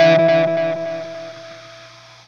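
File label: Delay Wah, Guitar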